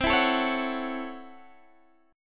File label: A 6th C, Chord; A6/C strummed